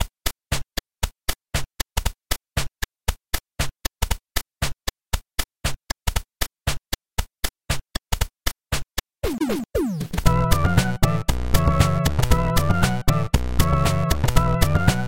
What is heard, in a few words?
digital minor blues